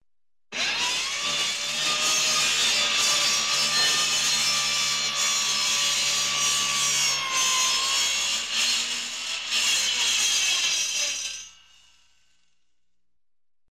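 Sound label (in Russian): raspil doski na cirkulyarnoi pile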